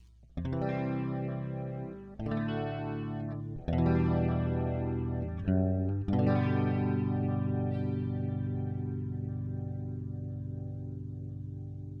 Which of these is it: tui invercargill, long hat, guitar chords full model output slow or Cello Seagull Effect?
guitar chords full model output slow